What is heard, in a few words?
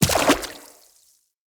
Infested Medium Land Water, Footsteps